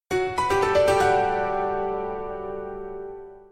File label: Jingle